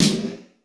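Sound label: processed snare, drums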